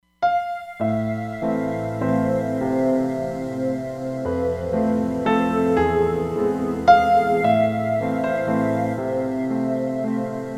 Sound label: X Piano + Vox, Roland Fantom XR